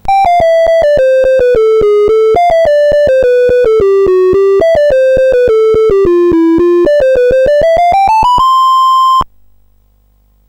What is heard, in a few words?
BASIC sid CSG8580R5; Cube